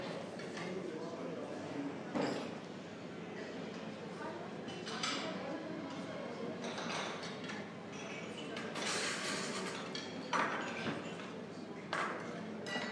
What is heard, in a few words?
morning restaurant ambience; glasgow crowne plaza